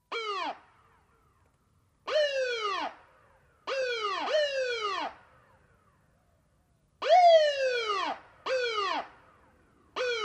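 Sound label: Ambulance Wailer Siren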